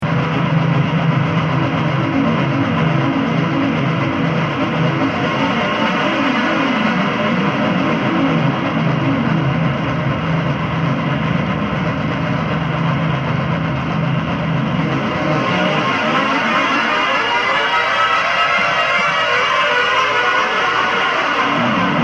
harmonizer

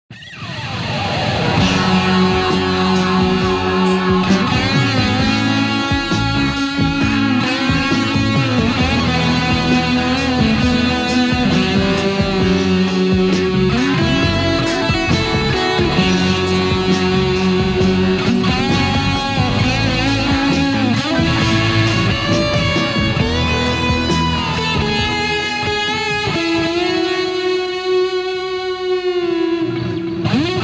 Solo Tube Driver; SSL5

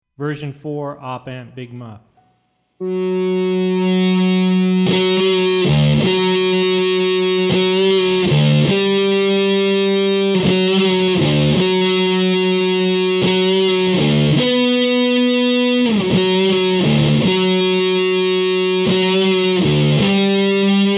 V4 Big Muff ICBM SUF Op Amp

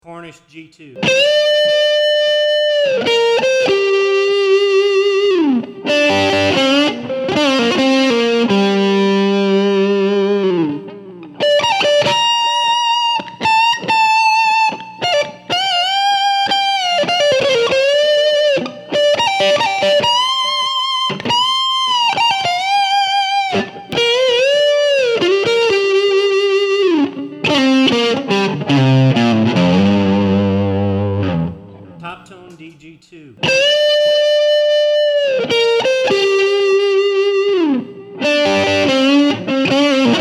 G2 vs DG2 Neck pickup

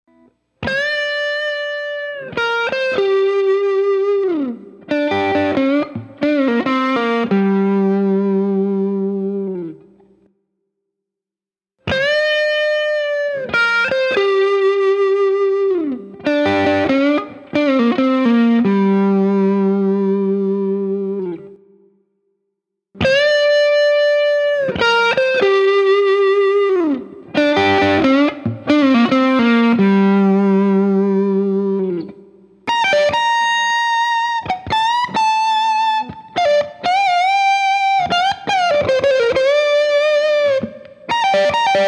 G-2 increasing sustain

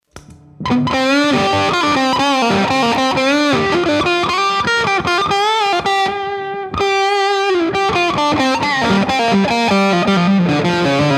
V8 Russian Muff Bass Big Muff SOLOING

